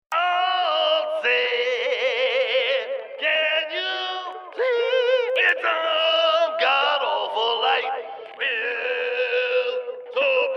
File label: solo